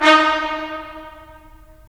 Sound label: BRS, Roland - LA Composer; Roland LA Composer vol. 4